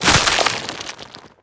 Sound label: zombie move loud, foley; creatures; Half Life Model Pack